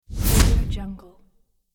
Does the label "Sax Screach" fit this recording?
No